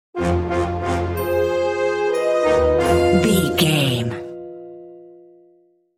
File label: black magic brewing stinger